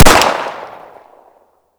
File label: colt fire; svencoop; hunger; weapons